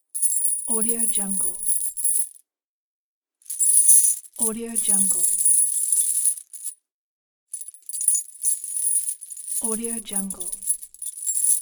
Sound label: chain